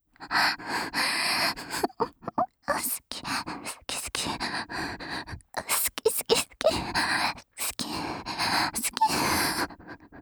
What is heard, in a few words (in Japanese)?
雨濡れ好き好き連呼セックス, ASMR